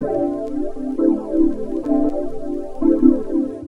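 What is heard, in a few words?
Pad Synth; Equipped Music; Loungin House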